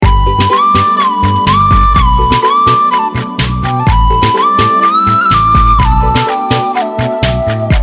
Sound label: Lovely Message Tune